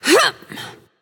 grunt jump; smite; voicepack; hera

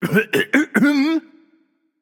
clear throat; Smite; bacchus

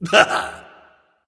heh heh; smite; hades

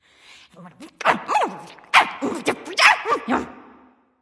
grumbling; smite; aphrodite

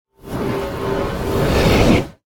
defeat soul suck; Smite; Cerberus

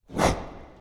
Victory Monster Vox Snap; Smite; Susano

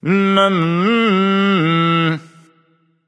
tongue; smite; arthur

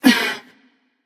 grunt lift passion; chaac

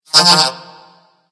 hehheh; smite; ganesha

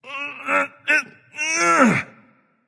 nv grunt lift; cernunnos

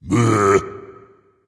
tongue dragon; fafnir

